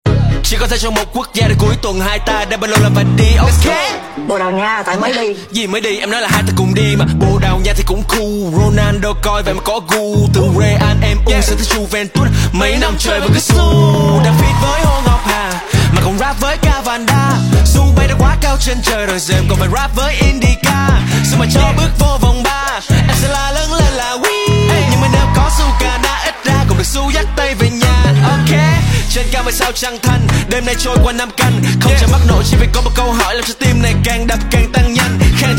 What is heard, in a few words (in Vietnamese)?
Rap Viet